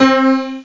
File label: piano ac mod; orchestra, keystring